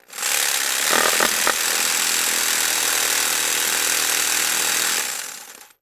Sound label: Jig Saw; Tools; 1001 Sound Effects